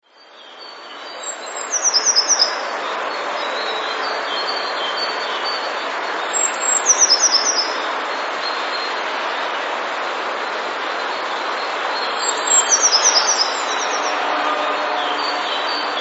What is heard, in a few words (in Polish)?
spiew